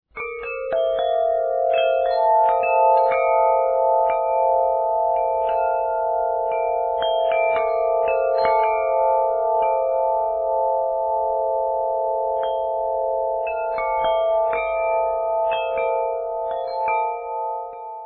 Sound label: Alto, windchime